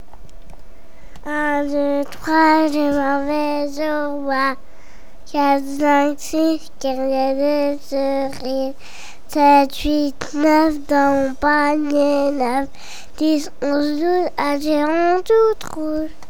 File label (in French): 1 2 3 je m en vais au bois